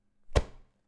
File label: Clothes impakt; Gods of Akragas